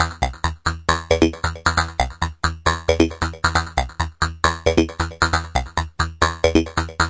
135 synth snack; melodic loops

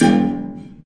fugged up piano hit; Piano and Epiano

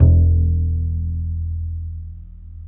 upright bass e2; Upright Bass Scale

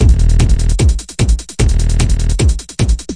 150 bigtone tekno; 141 to 160 bpm

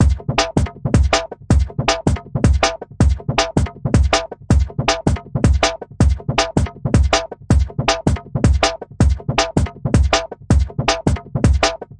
160 simple dnb wah wahed; 141 to 160 bpm

